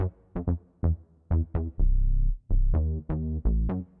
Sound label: bass; musdb18